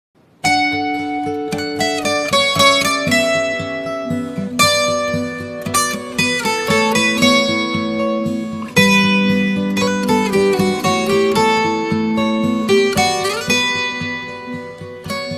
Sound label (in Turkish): Islık Gitar; İstanbullu Gelin; Süreyya Faruk